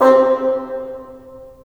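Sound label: wind bassoon; LA Composer vol. 3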